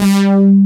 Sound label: analog stab; Pro-Rec - Synthsonic; TRINITY